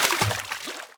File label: water; firefight